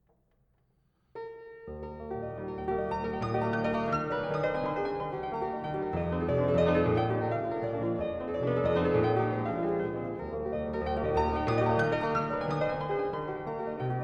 Preludes Op 28 - No 19 in e flat major, Piano; Frederic Chopin

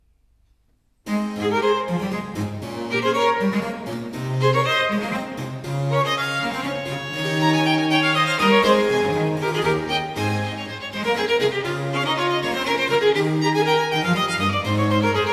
Sonata No. 1 in A Major (Allegro); Haendel; Sonate a trio op. 5

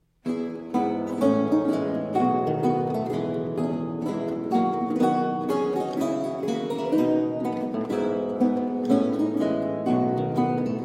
Duet, Gavotte in F Major, Lute; Anton Logy

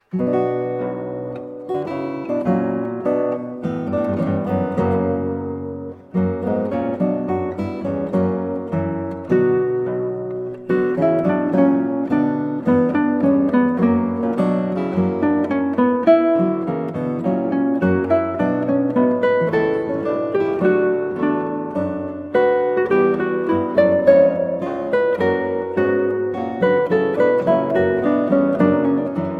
Adagio from Flute Sonata in B Minor, Guitar; HWV 376; Handel; George Frideric Handel

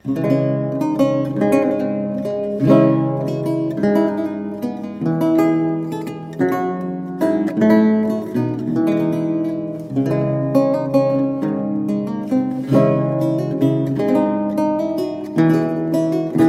Gavotte Gigue d'Angleterre in D Minor; Les Larmes of Johannes Fresneau

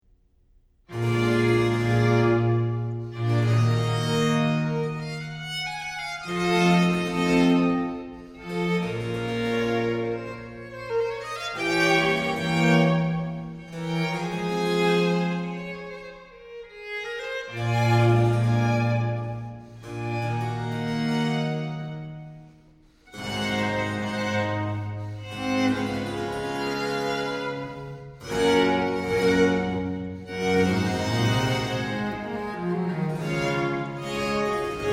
Adago, Concerto in G major for Strings; Antonio Vivaldi; RV 151

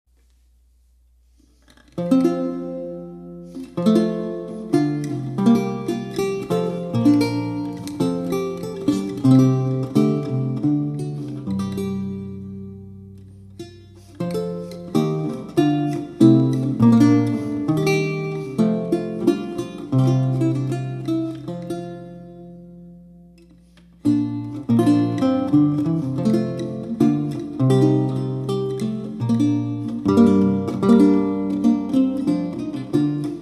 Lute; Die lustelycke meg; Pierre Phalese

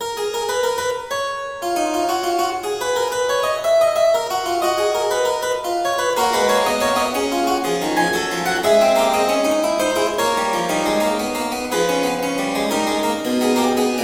chorale prelude for organ BWV 674; Kyrie Gott heiliger Geist (II); Selections from JS Bach ClavierUbung III; Johann Sebastian Bach

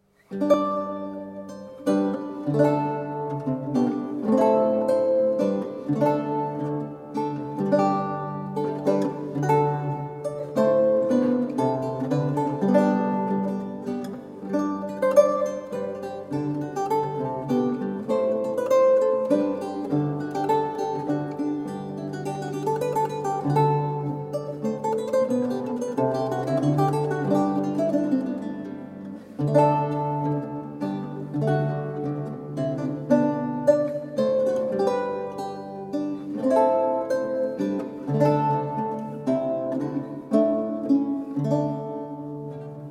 Galliard, Lute music; Lady Rich Galliard; John Dowland; Lute songs

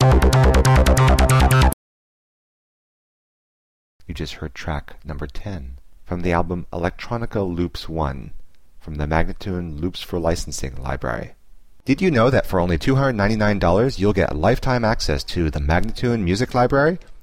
140 C ambient teknology spoken; Electronica Loops 1

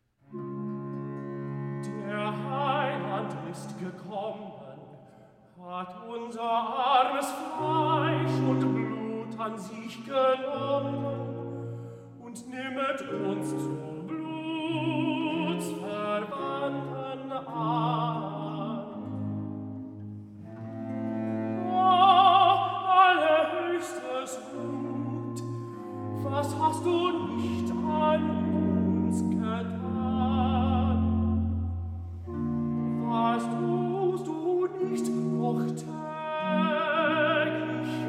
Recitative; Nun komm der Heiden Heiland BWV 61; Der Heiland ist gekommen; Bach; Cantatas

